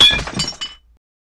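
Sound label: Bottle Break Generic; bottles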